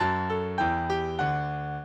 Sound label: minuet, mozart